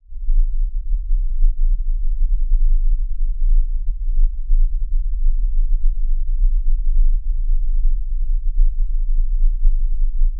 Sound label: infrasound under the volcano